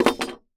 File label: Industry Machete Drop On Plywood